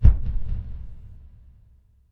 Distant Low Blast

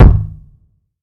Heavy kicks

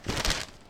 Newspaper Crunching

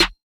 Trap Snare

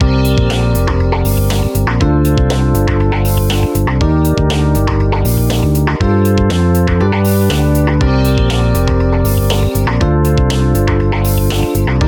Loop

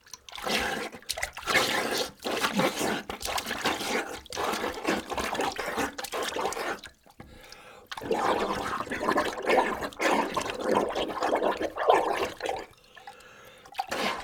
Drowning Noise

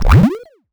Get Item 8 Bit

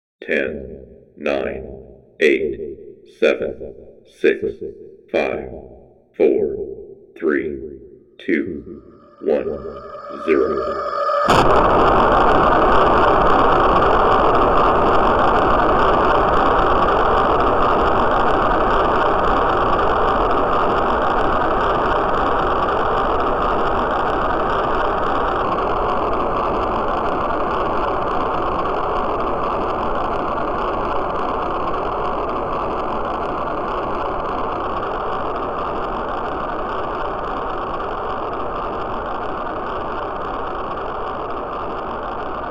Simulation Of Nasa Rocket Launch

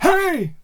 Male Shouting Yelling Hey

